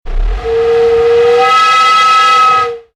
sound design air pipe pressure, Pressurized Air Release; Sound Design - Pressurized Air Or Steam Release From Pipe; Hisses; Objects; McKinney Sound